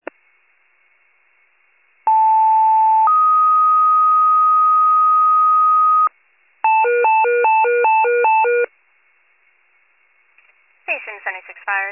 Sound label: FIRE pager alert